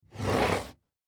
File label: Foley Footstep Golf Cleats Scrape On Hollow Wood Surface; Foley Footsteps